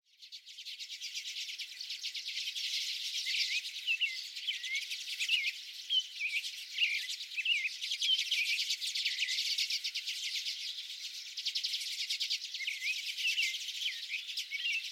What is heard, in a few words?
American Robin and Common Redpoll from office window